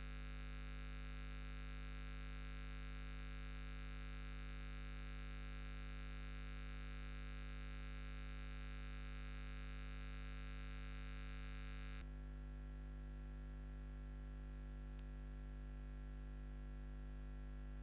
electric noise, เสียง"จี่"ที่ปนมากับสายไฟฟ้าภายในอาคาร มาเป็นบางช่วงและจะเงียบไปเอง; Custom Guitar Pedal